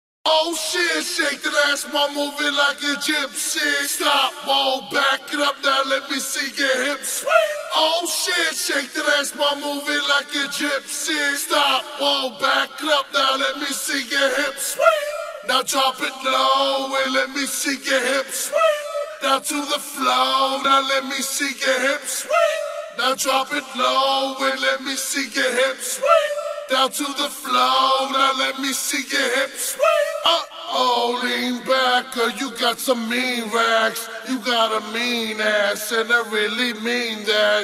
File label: Acapella; Studio Acapella